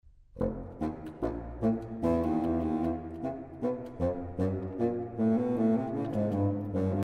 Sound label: Bass Nighingle